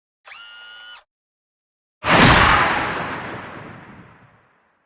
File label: breakdown